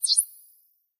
interface button, clicks

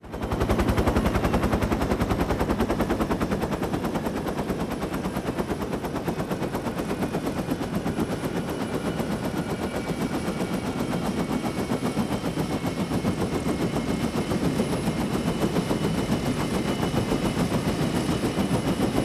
UH-1 Huey: Interior Idle And Power-down; Mono

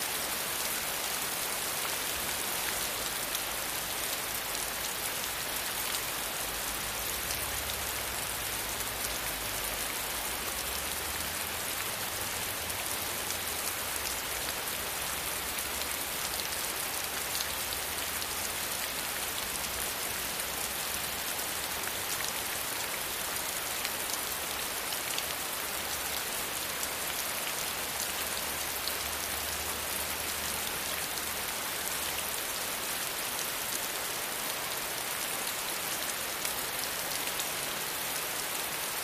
Rain Fall Medium, ext

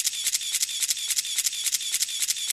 CAMERA MOTOR

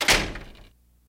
Door Close Thin, half glass

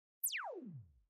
Bleep Descend Quick; beeps

